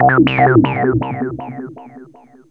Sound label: ANALOG ECHO; Zero-G Ambient 1